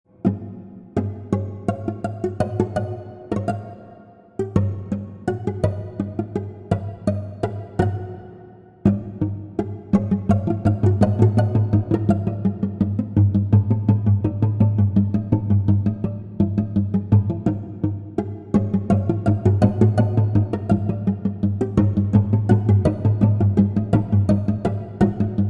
Laendler; Westphalen

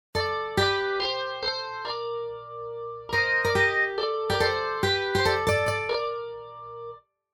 Electric Djingle

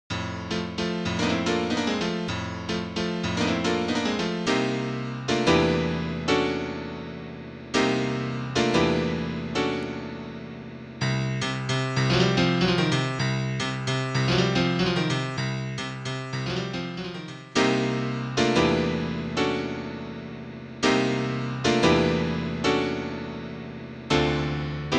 Klavier

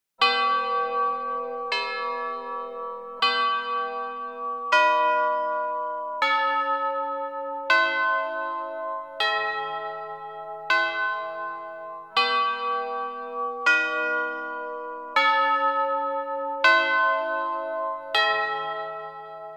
das Spiel der grossen Glocken

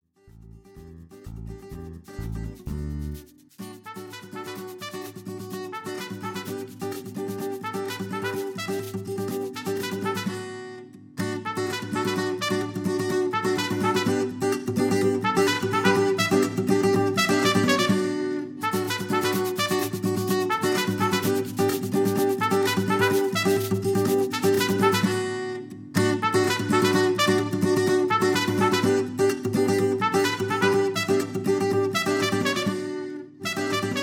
mexikanische Fanfare

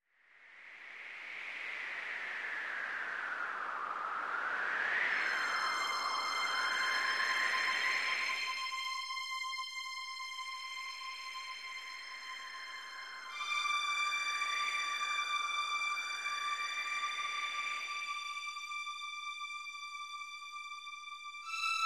Wind Geige

